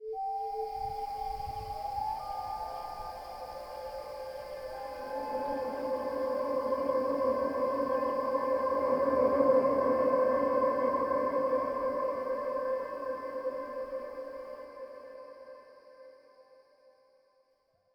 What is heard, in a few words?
Stinger Night; Peak (2025)